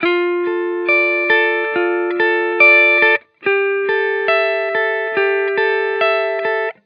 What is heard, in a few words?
Hip Hop Guitar Loop - 140 BPM D Min; Cymatics; Vibrations Guitar Sample Pack